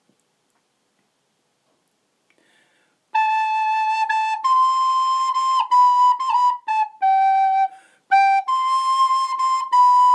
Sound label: pastime descant